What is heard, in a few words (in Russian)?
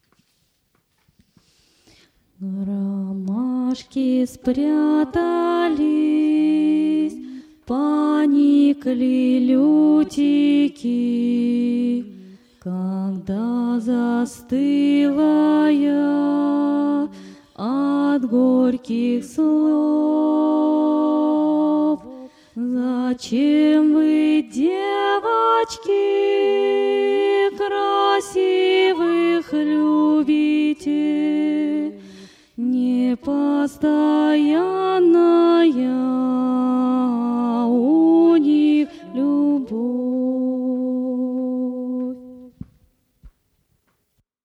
второй голос